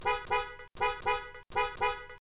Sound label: Tone Alert